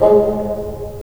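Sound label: wind bassoon; LA Composer vol. 3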